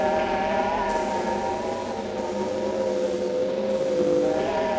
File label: Cracklature, sparse soundscape